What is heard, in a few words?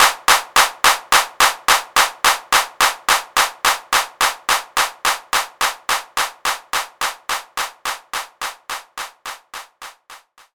Synth Hand Clap